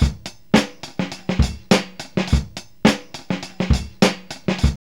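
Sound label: 104 bpm, Funky loops; Zero-G; Funky Elements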